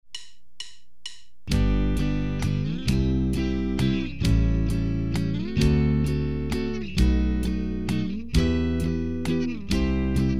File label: Christmas song progression using E-based barre ch, guitar